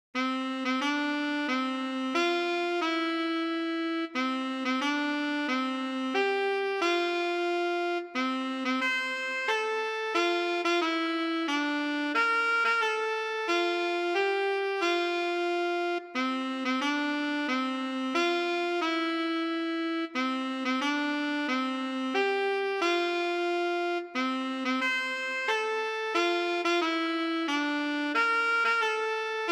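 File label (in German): solo Happy Birthday Altsaxophon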